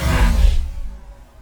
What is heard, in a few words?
Explosion Wave; KriptoFX; Realistic Effects Pack v1